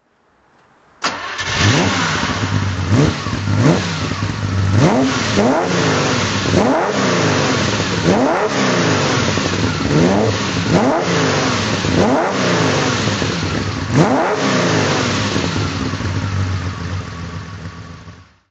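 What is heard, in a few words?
ferrari 275gtb4 throttle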